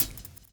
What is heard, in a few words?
drum chop; AMG Gota Yashiki Groove Activator